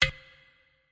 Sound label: big space perc; ETHNIC and WORLD PERCUSSION